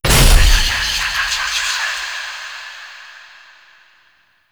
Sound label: Vaults Vault Open; Gameplay; Warframe; Entrati